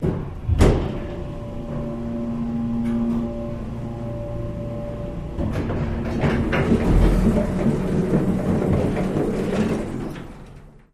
Passenger Elevator

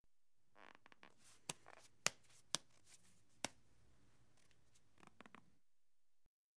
Baseball Ball Hand M; baseball fx & games

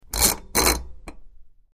Metal Dial Turn Click

